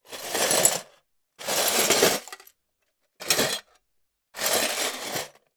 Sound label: foley glass broken movements in metal tin; zapsplat